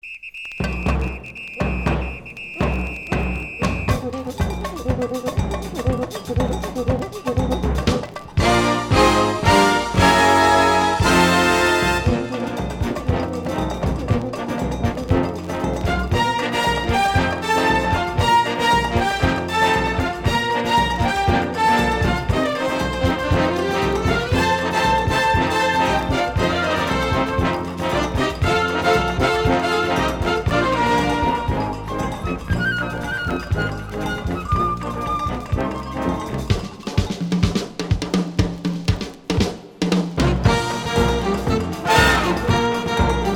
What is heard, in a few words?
CIELITO LINDO